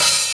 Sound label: OPHAT